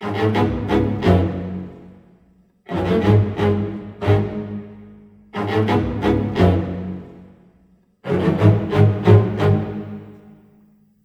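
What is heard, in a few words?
C# minor, Low Strings, 90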